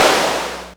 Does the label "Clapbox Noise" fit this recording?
Yes